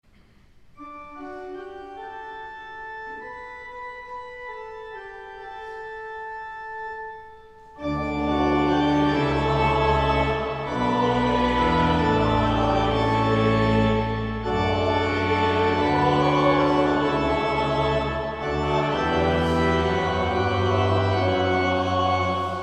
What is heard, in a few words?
Trisagion; David Hurd; St. John's Cathedral, Jacksonville Florida